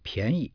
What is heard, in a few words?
piany